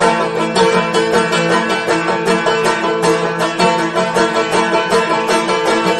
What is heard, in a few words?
banjo; music